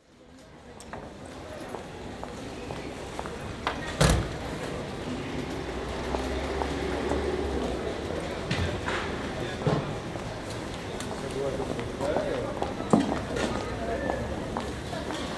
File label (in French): contours du marché; Metabole